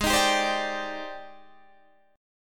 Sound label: G sharp Major Sharp 11th, Guitar Open D